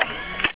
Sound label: build, sentry gun; CSSB